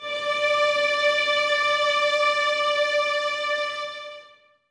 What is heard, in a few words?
violins D 5, String Ensembles, 23 Violins; Miroslav Vitous